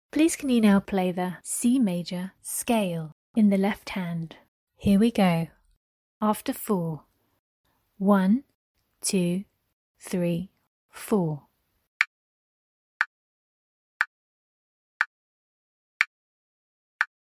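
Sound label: C major scale left hand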